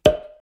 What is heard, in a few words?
pop